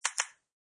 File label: Cell phone is flicked open; Cell Phones; Electronics; Cell Phone Flicks Open